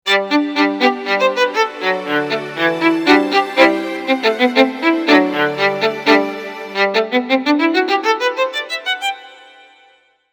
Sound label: Violin Staccato; Pa2x